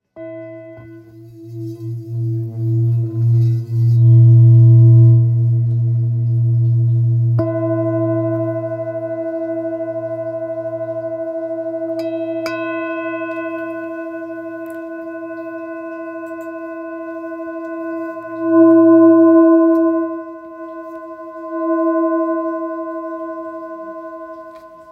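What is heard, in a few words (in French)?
Thérapeute la dièse, bols chantants tibétains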